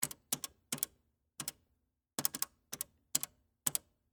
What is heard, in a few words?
Telephone Dial, Technology, Telephone, Dial, Long, Distance, Pay, Phone; Telephone Sound Effects; BlastWave